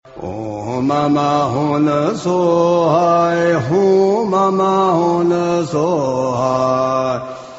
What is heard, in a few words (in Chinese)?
佛教音乐-大白伞盖佛母心咒